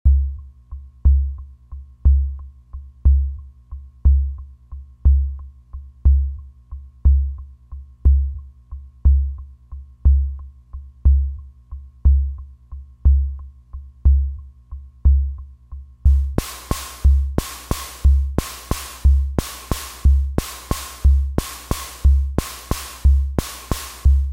Rhythm-Matic

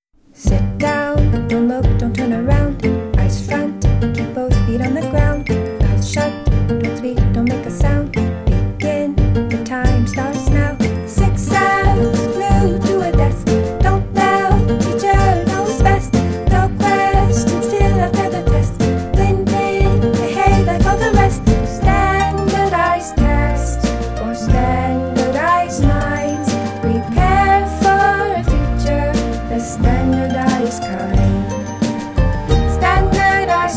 spaceship

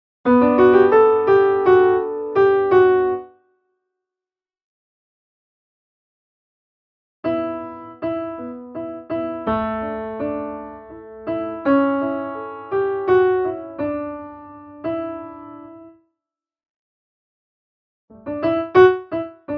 Piano

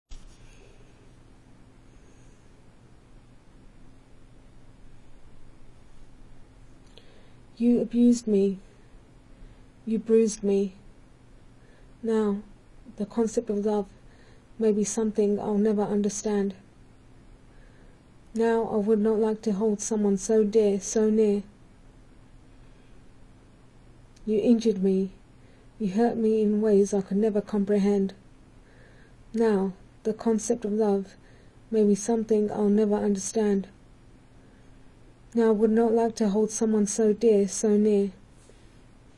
harp

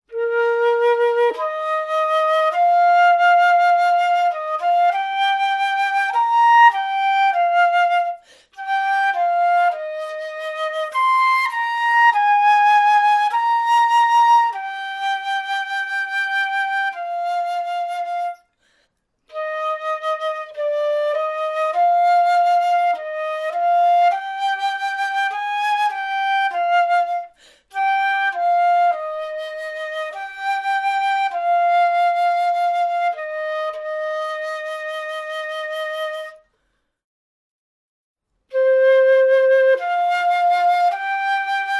flute 100 bpm

